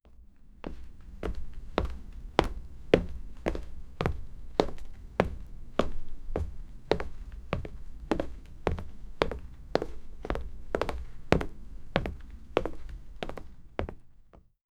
foot steps sound; human activity

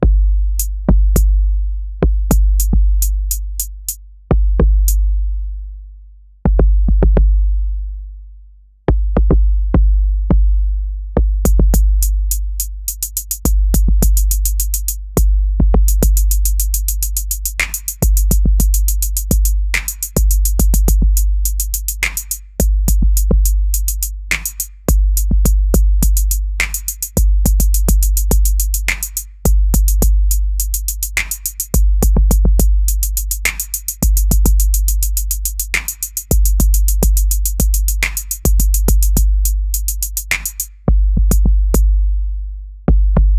trap drum and 808 bass; beat

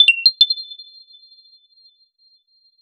32 bit retro game menu, ps1

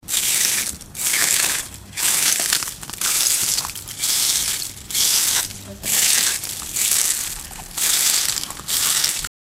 harvesting peas, farming

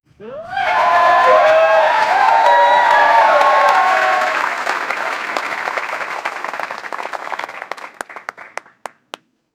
audience erupts into applause

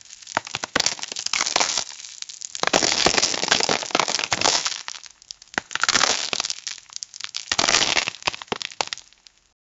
bunch of very small rocks

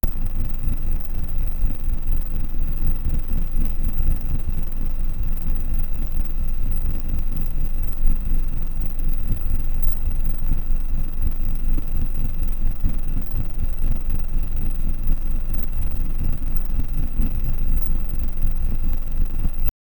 the rhythmic chirping of, insects

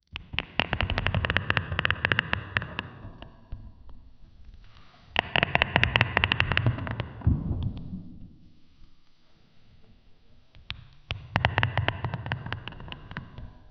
realistic sound as if wal, cave

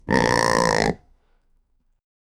long loud pig grunt

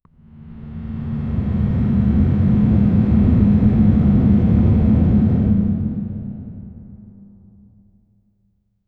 soft air vent releasing

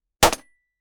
video game bow and arrow

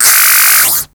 electric, sound-effects

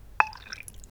drinking a water of glass; household

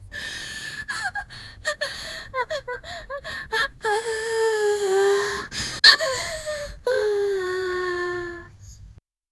realistic sound of a 15 y, shaky

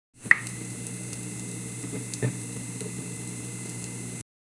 cooking video recipe, boiling

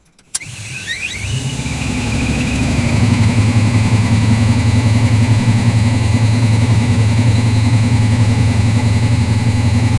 realistic sound of a germ, locomotive

